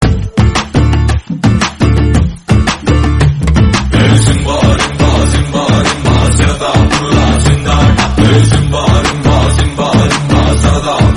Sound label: new tone